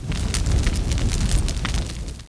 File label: burning ruin; Caesar III